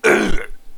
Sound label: pain, zombies